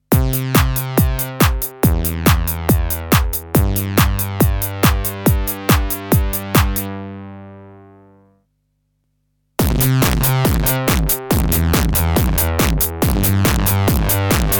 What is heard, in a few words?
ultra distortion plus channel overdrive